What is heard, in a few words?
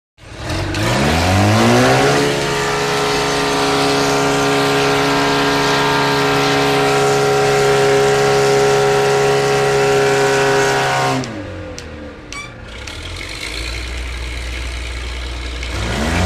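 Crane Drill Heavy Rattl, cranes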